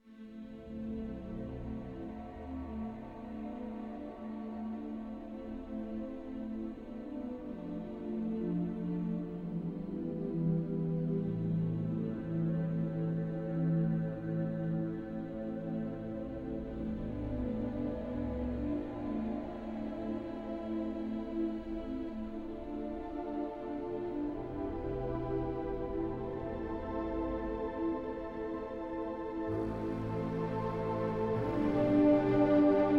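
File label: Ambient Requiem; Shadowgrounds; windows gamerip 2006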